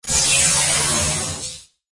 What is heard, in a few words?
lightning energize